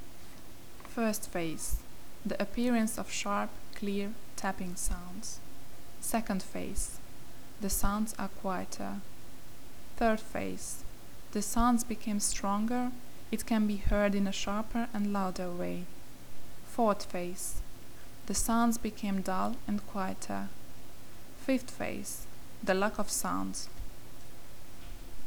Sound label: Korotkov sounds